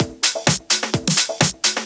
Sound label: Latin Loop 128 BPM